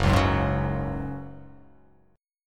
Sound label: F sharp Suspended 2nd; strummed; JGuitar